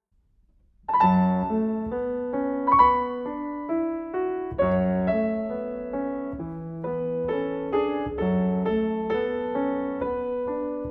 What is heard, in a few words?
chinese new year live piano accomp